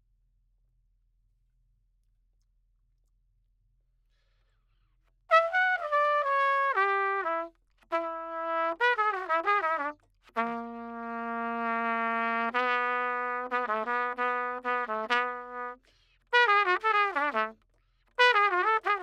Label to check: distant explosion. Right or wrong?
Wrong